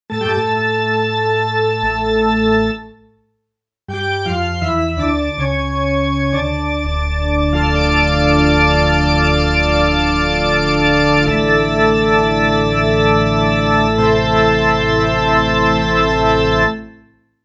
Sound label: Pipe Organ, Roland JV-880; Factory Patches